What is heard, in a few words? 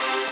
lead